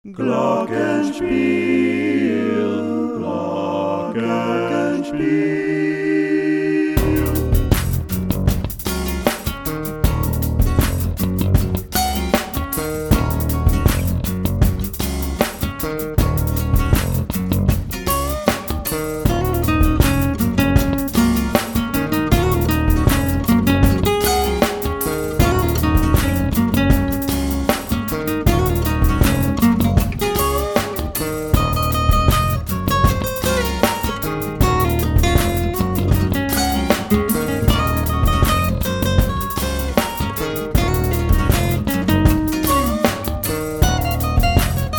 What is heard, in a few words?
Glockenspiel; Grimace